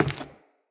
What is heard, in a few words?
Grunt Step Wood, footsteps; Enemy; Characters; Corrupted Sproutfolk